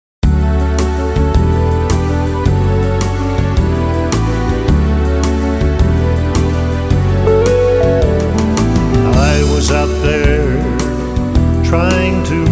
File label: Singing For The Lord